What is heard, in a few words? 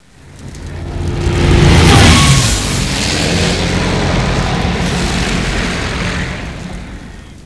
flyby